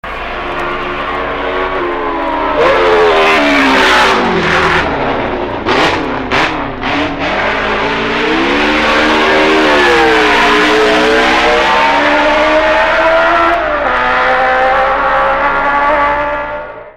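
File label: MV, motorcycle; 1962; G Hocking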